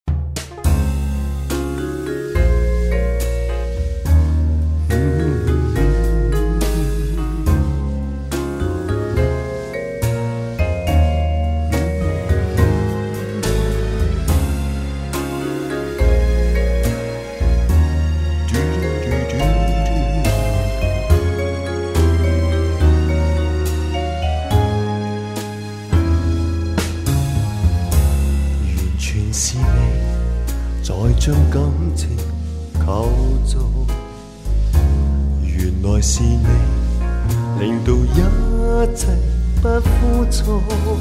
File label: Dinner Jazz